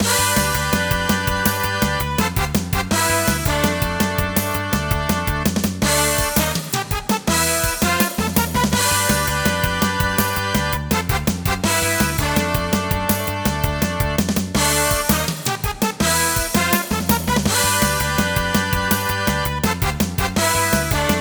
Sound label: Continue; super sidekicks 3 the next glory; neo geo cd neo geo